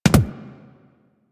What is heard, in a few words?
Weapon Punch Double